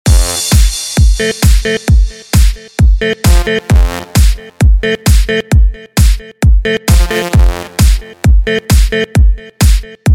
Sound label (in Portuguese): eletrofunk